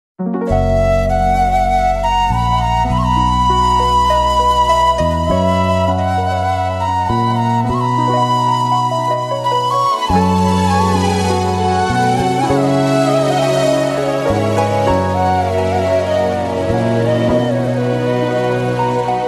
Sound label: vande mataram instrumental flute ringtone